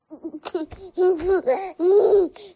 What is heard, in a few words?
vox 10 month baby really excited laugh build